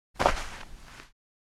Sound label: Footsteps single step on sand